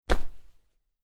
Cloth Character Movement Foley Whoosh

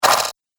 Rattle Hit Game Fx; Video Game Sound Effects

